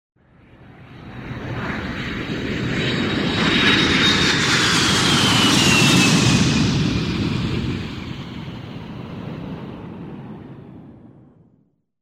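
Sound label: sounds of airport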